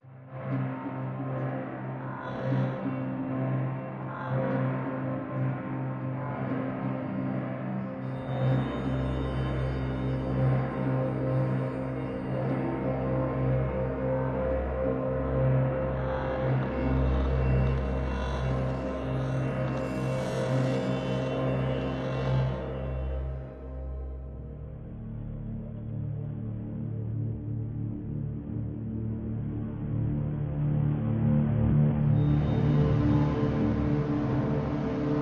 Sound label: Pads Only